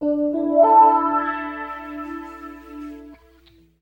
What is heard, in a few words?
GTR; East West; Phat & Phunky